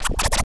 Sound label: SCRATCHES; Scratch 1